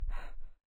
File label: Breath; Lewd SFX